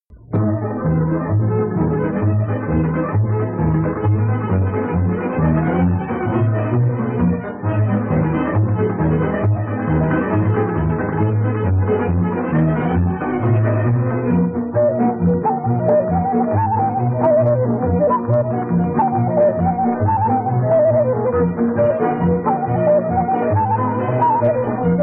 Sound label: Romania Hora din Goicesti, Folk Dances; Petaluma; 2nd String Folk Dances; Romanian